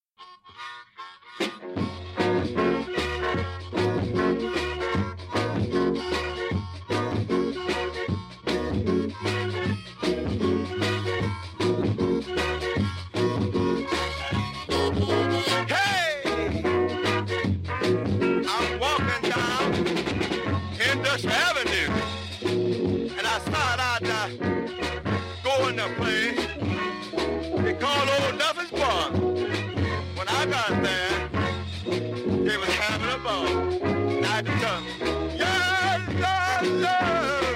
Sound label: 1963; Fortune Vintage Blues